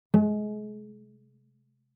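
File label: Cello pizz fortissimo sul G Ab2; MIS Pitches - 2014; Strings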